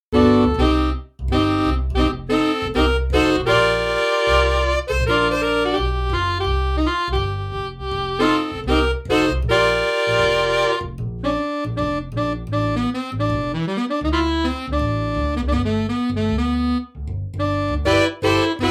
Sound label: Jazzed Gar Rev